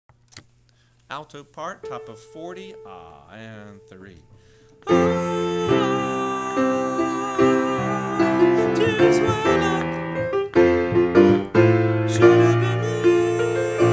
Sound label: Alto